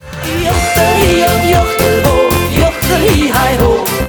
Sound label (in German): Klingelton